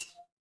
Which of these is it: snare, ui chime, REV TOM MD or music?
ui chime